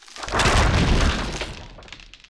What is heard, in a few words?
icefall, environment; t2-mapper